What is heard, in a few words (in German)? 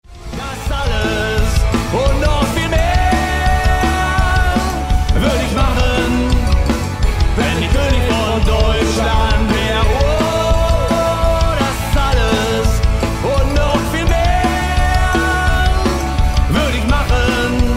Live Deutschrock Mix